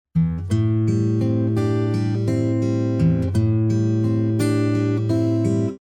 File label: text tone